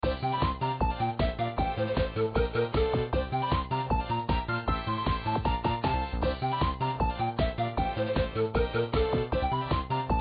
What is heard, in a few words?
BGM